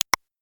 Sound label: menu open